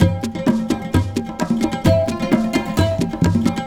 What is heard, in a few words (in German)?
MUSIK; MOBICLIC 89